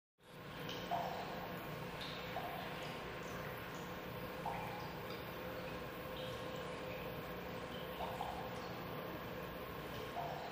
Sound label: Drips Industrial